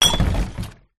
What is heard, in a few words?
impacts glass bottle hit junk in plastic bin no smash